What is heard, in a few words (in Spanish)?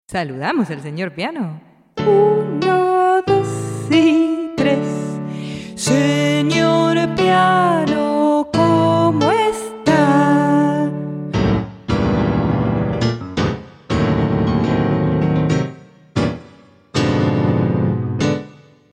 piano enojado